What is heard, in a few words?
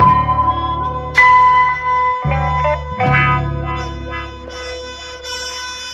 horns and keys, Loops, Phonky